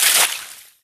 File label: meth breaking